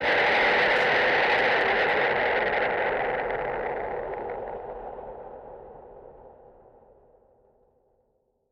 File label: Distorted Scream; SoundsCrate